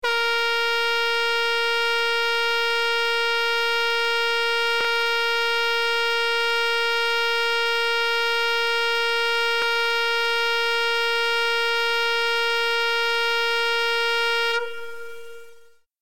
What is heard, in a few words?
red alert